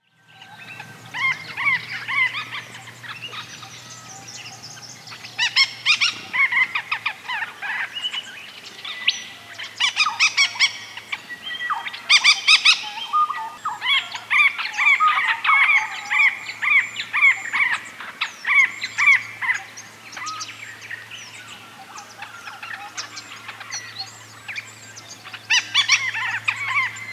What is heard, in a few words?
Hall's babbler